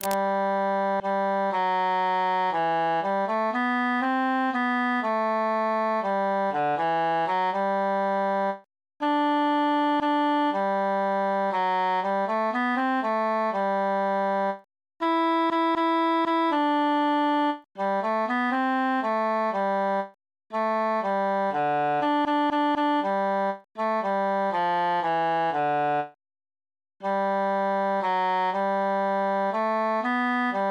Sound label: transposed tenor solo; se la face